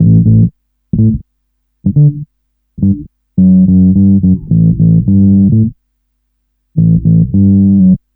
ROL BASS; USB Soundscan vol.31; Miro s Grooves; AKAI

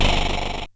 Bent Cymbal; glitch; Bent Hits

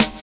HI RIDER; Spectrasonics; Metamorphosis

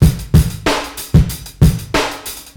Trashcans 93bpm, Hip-Hop Street Beats; Sonic Foundry (Sony Creative Software); Hip-Hop Street Beats Construction Kit; Street Beats by Poogie Bell

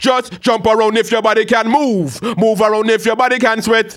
HAL RAGGAM, RAGGA-MUFF A maj

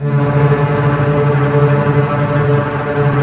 Tremolo; Roland L-CD702; Cbs FX

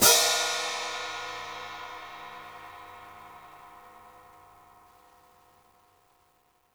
hihat - open; JazzFunkKit

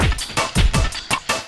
LOOP, 162 BPM, Drum & Bass; Ueberschall; Drum & Bass Resonance

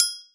AFRO BELL; AGO GO; AKAI S-Series CD-ROM Sound Library VOL-5

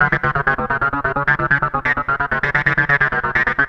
Atonaloop, Industrial Analog

